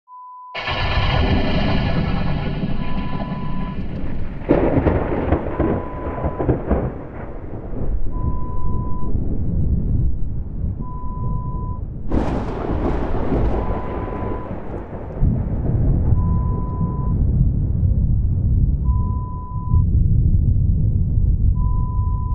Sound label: Storm